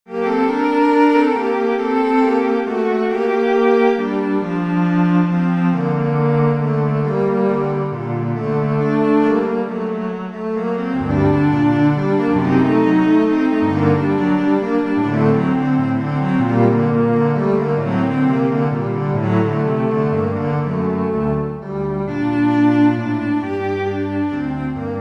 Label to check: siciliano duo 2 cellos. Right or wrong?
Right